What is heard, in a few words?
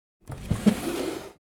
Wooden drawer opening